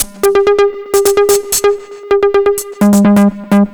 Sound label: Perc 128; musicradar